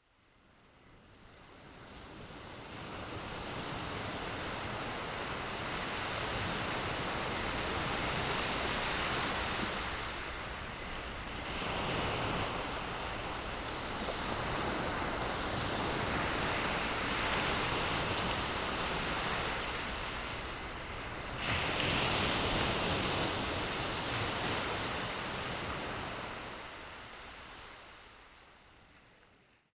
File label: ocean and crickets; sound oasis